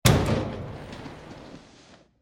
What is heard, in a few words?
Metal Impact; Bursts; Impacts; Crafted Signals